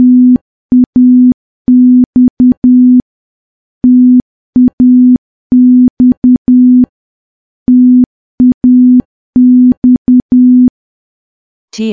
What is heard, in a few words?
morse training 10WPM